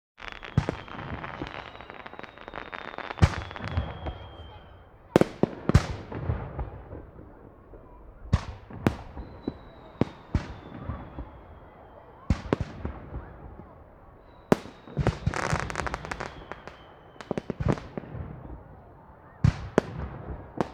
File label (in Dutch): Brandweershow; KMP Film Video Sound Effects; vrije tijd